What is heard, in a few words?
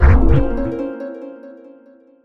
Pollen Plant Bump